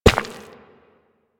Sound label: Run Rock, Footsteps; Warframe; Operator